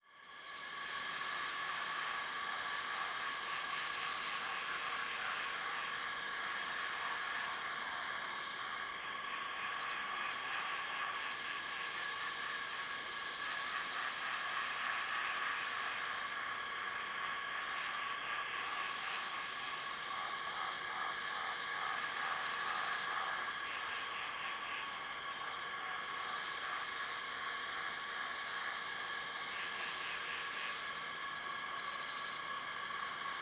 Animal Ambience Jungle Rain Forest Insects Creatures; animals; AdobeCC